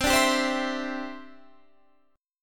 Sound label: D 9th Suspended 4th C